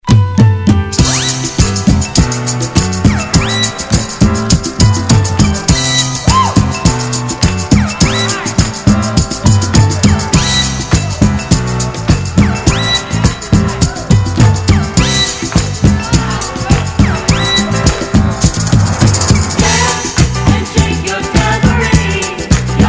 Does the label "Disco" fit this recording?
Yes